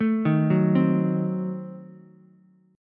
F sharp Diminished 7th, Ukulele; arpeggiated; JGuitar

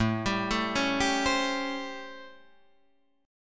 A 7th Sharp 9th, Guitar, Open D; 7,10,7,7,10,10

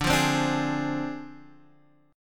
D Minor 7th Flat 5th Guitar Half Step Down

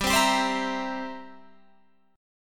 C 7th G

